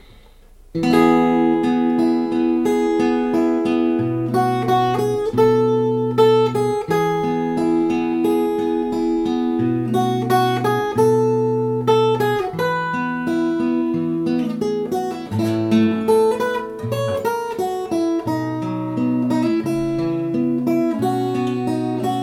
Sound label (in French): Guitare Folk; Arrangement complet